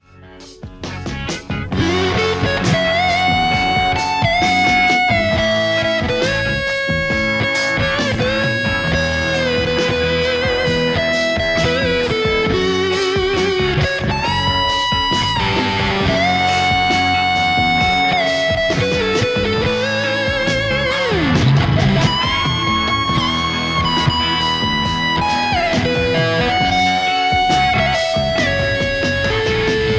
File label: lespaul